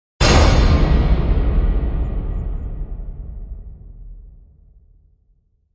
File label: Depth Strike; Gothic Storm Music